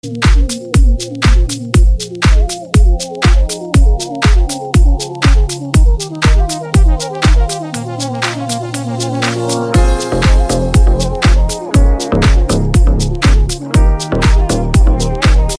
iphone8 ringtone new

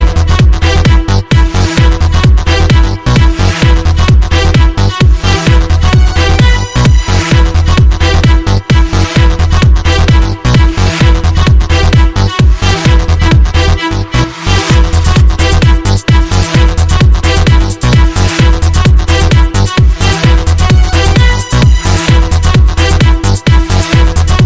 cool music house ringtone

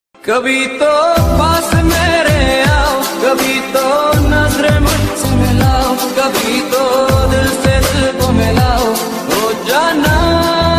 unplugged ringtone

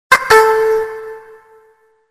icq notification tone ringtone

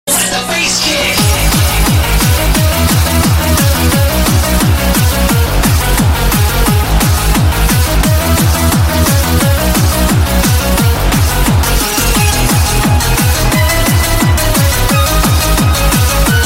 best iphonic ringtone

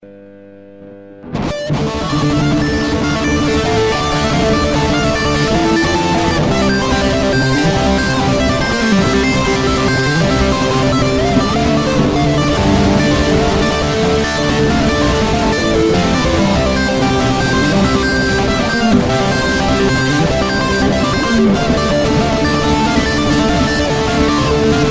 norwegian tune ringtone

